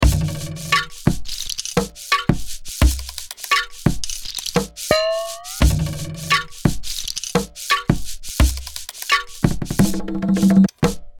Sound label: Beats